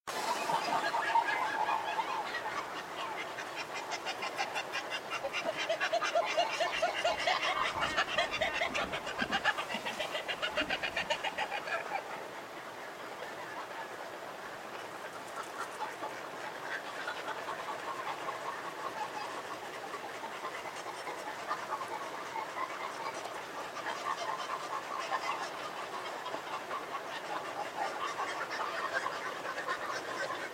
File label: antartic petrels birds singing; soundscapes